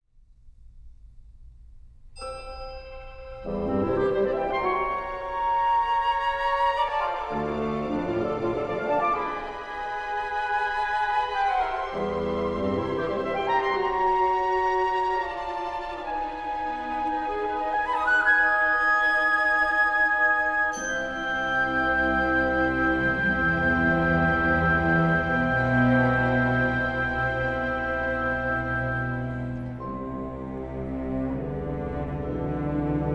serenade, orchestra

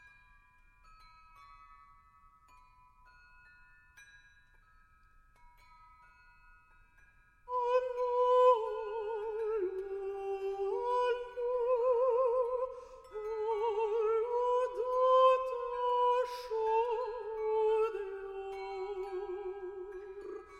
folk songs

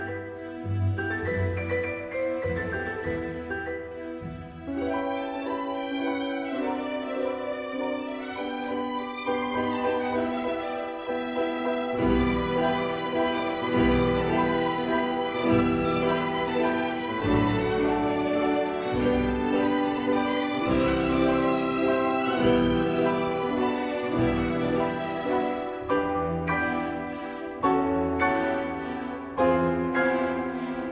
moderato espressivo

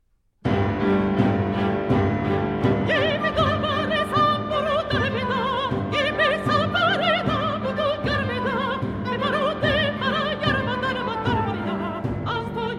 folk songs

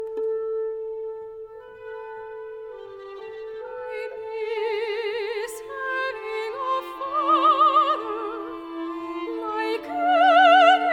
aria, soprano

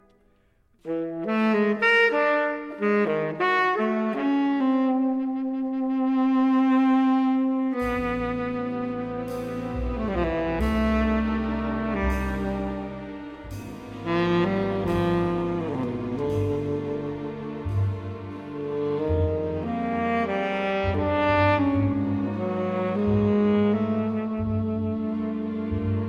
saxophone concerto ii ballad

